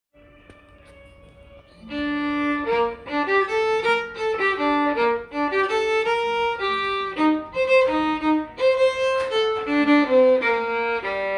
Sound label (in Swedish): Europa runt stämma